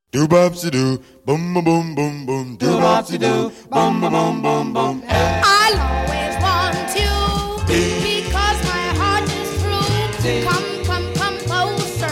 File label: doowop sounds